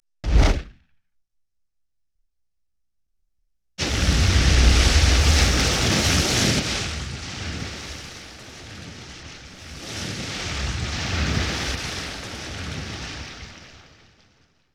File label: Explosion; Explosions - 5.1 Surround